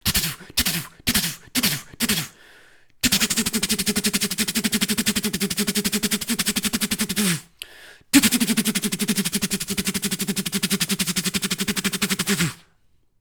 Burst Fire; The Foley Boys

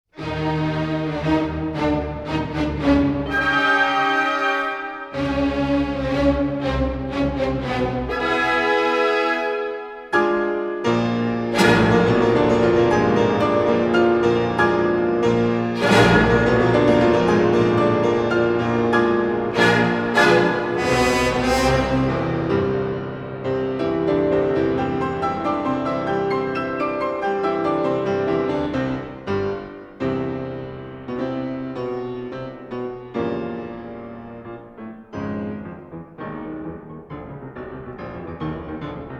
Concerto for Piano; Ukraine Symphony Orchestra

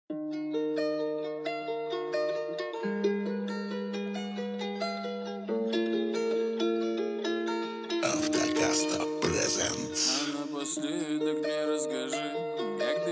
37-41Hz Low Bass

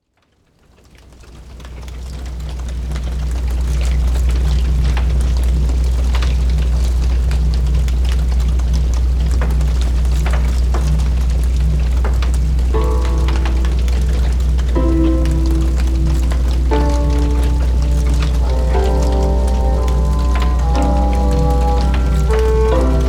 Night Rain; Outside Broadcast Recordings

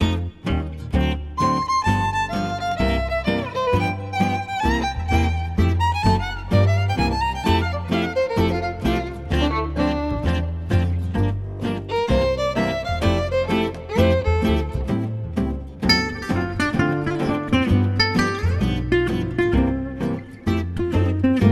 Gypsy Jazz Manouche

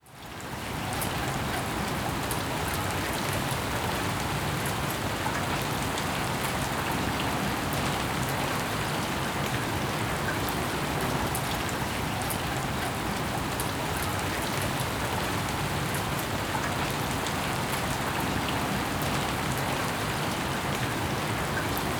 Fleeting Rain

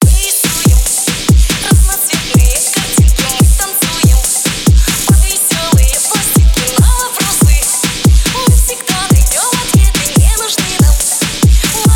phonk

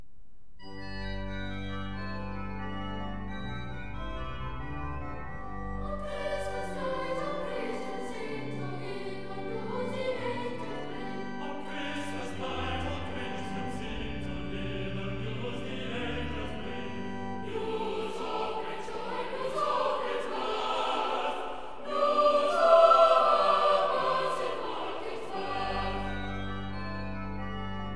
Choir, Sussex Carol

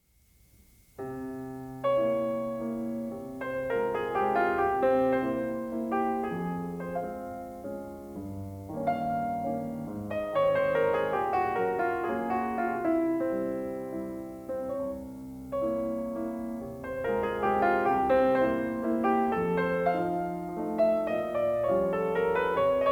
Mazurka in F minor; Chopin

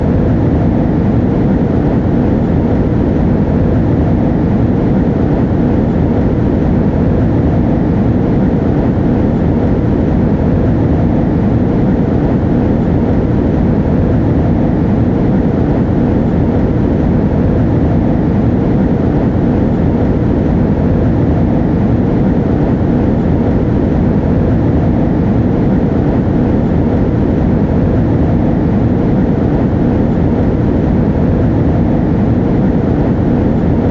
Plane Cabin

